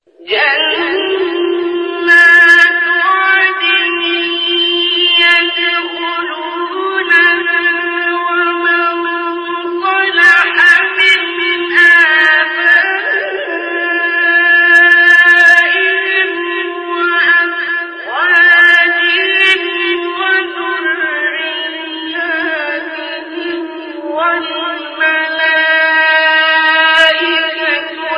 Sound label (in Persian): فراز زیبای تلاوت آیه سوره رعد; استاد عبدالباسط